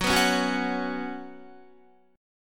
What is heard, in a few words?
Gb Suspended 4th Gb, down, Guitar